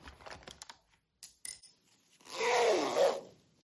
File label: typing keyboard; Foley